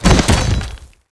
Core die

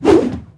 Two Hand Axe

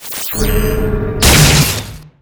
Light Burst; Seer; GamigoNA

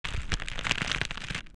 King Spider Run

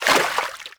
Turtle Sea run

